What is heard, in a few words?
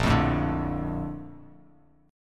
Bb Major 7th, Bb, down; strummed; JGuitar